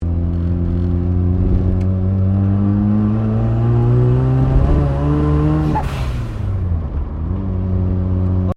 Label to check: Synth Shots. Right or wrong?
Wrong